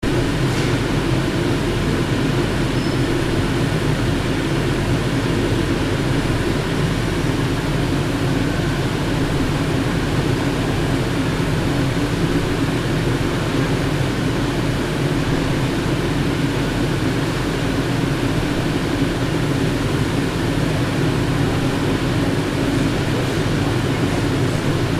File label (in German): luftwandanlage